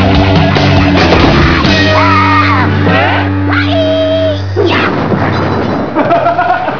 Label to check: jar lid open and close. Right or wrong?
Wrong